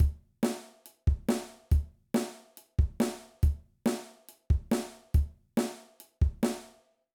Punk Beat

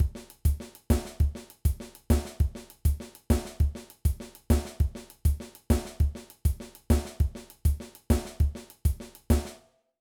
Impressive Beat

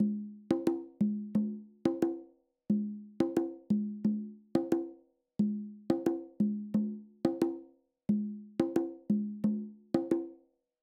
Conga Pattern

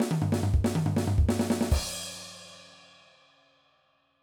Punk Fill, drum

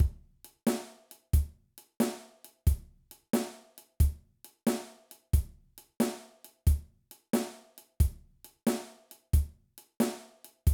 Blues Shuffle, drum

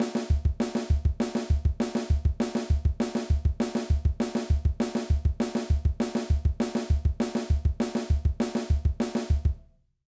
Hand and Foot Exercise, drum

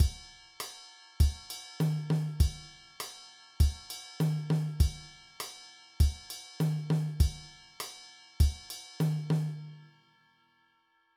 Mambo Beat, drum

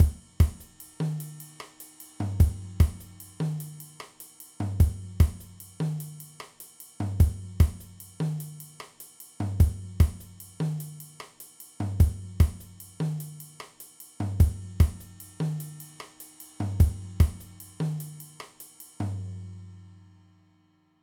Afro Cuban

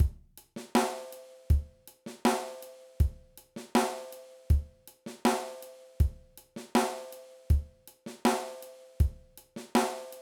Ghost Notes Exercise, drum

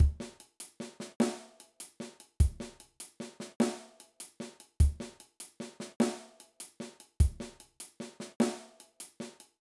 Shuffle Groove, drum

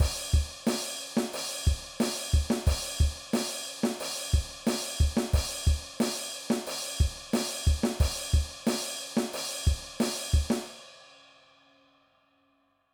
Rock Drum Beat

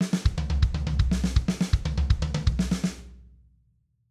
Triplet Fill, drum

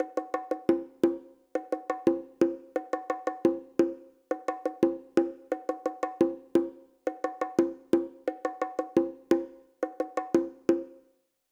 Bongo Pattern

